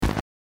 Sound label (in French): eolien; energie